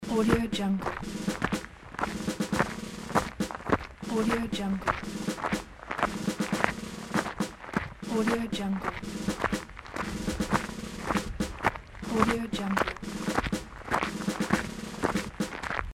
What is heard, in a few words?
soldiers marching